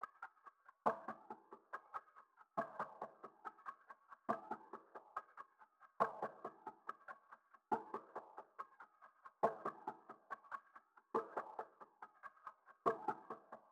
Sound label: Drum Loop - 140 BPM Percussion; Loop Stems; Cymatics; DOPE DRUMS VOL. 1; Pluto